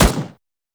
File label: Turret First Shot; weapons; Lasrian APC; Warframe